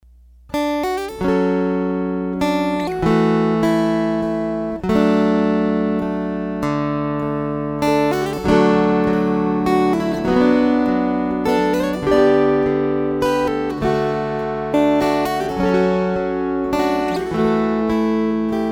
String Choir

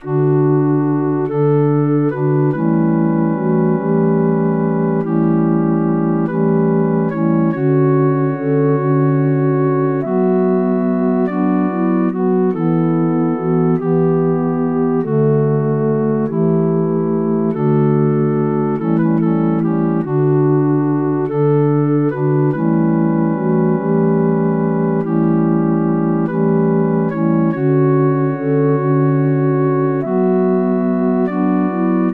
Skaters Waltz Melody Harmony

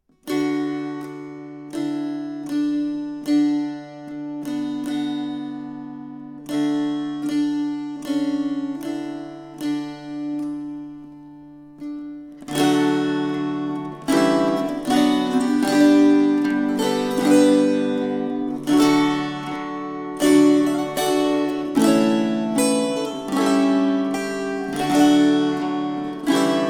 String Choir

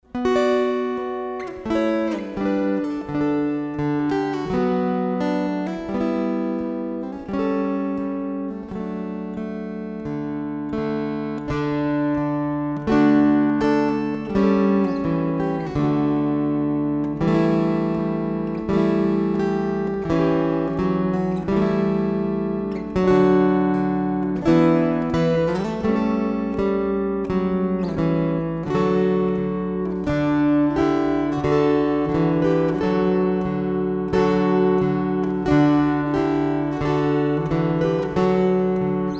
String Choir

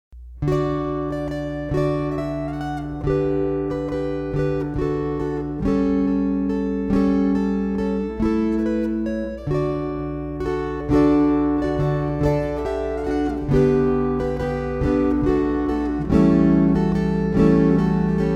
Waltzes

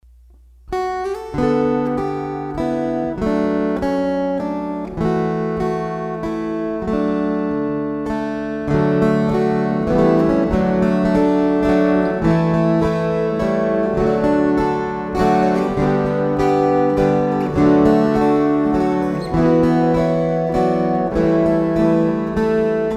String Choir

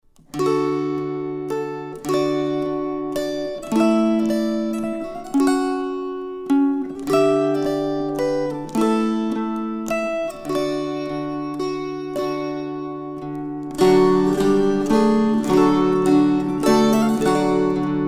String Choir

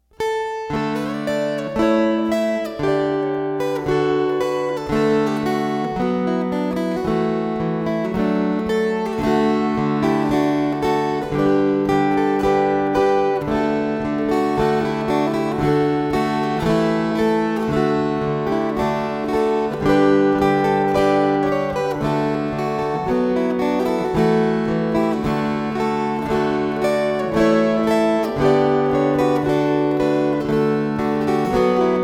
String Choir

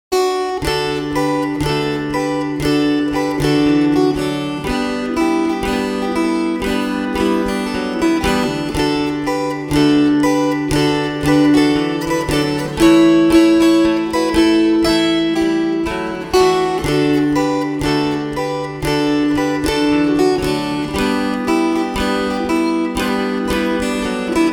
Rag slow

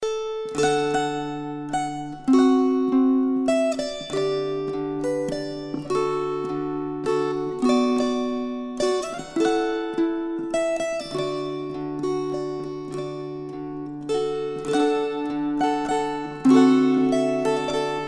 String Choir

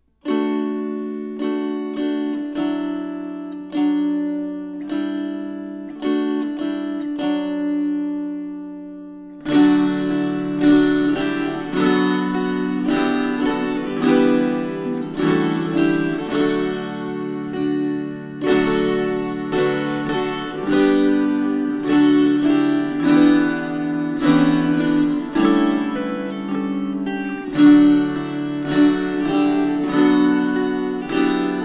String Choir

